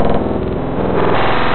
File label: 8 Bit Noise